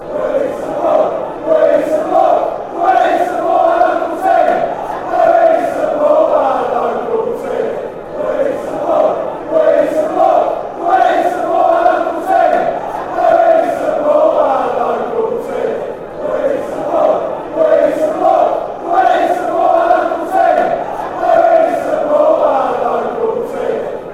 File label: we support our local team fanchants